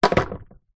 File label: industrial leaf blower electric small set down ground